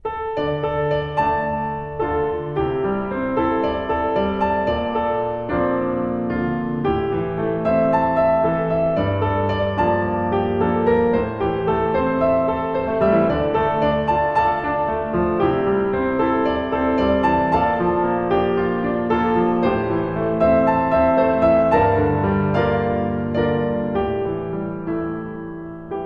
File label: piano